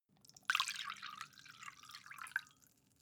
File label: mug pour; soundpool